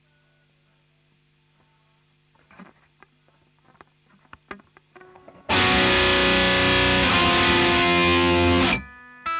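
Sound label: guitar; Marshal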